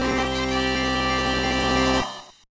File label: Win; Bowser Land